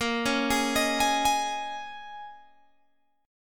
Bb Minor 11th Bb down Guitar Open G

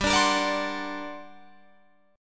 G Minor Major 11th, G, Guitar, Drop D

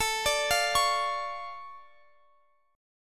D Minor Major 7th, A, guitar

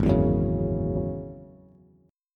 Ab 7th Suspended 4th Sharp 5th, Bass; Ab7sus4#5; 4,7,4,6; strummed; chordshape; JGuitar

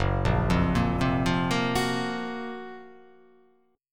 F sharp Major 7th Flat 5th

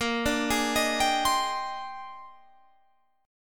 Bb 13th

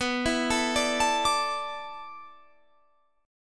D 6th Suspended 2nd B, chord; D6sus2/B arpeggiated

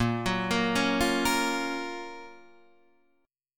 F sharp Major 7th

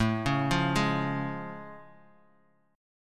A Augmented Major 7th A Bass Standard; arpeggiated; 17,16,15,13; JGuitar

